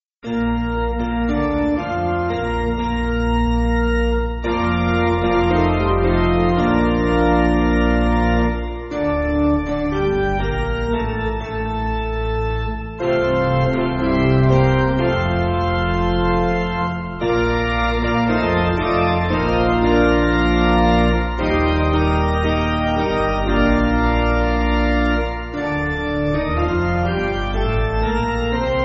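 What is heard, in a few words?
Piano, Backing Files for Churches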